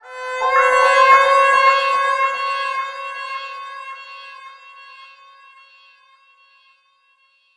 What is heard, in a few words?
VIOLINS FX; USB Soundscan vol.60; Asian Traditions; AKAI; VIOLON FX